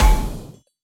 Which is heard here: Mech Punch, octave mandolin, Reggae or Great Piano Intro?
Mech Punch